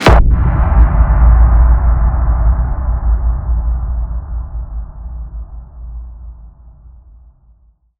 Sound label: Impact Knock